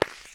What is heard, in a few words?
smokegren start, guns